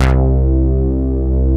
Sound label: ANA KEYS; Pro-Rec - Synthsonic; JV ANALOG1